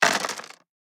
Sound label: DICE on Plastic, Throw and Roll, Standard, 16 Sixteen Dice; Samples; ArticulatedSounds; DICE Sfx Party (Extended)